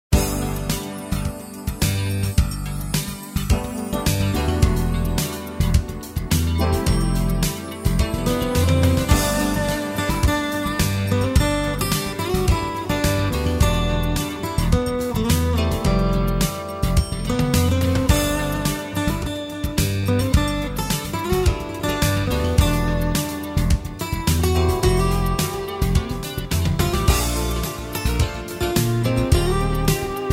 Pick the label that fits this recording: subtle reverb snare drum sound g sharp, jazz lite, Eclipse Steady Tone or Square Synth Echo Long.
jazz lite